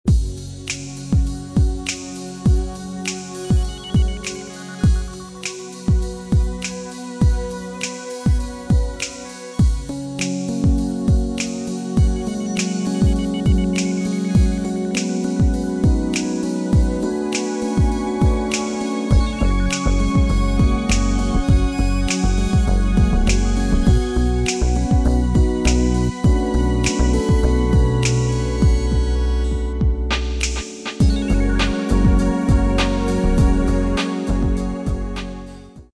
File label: light jazz ambient ocean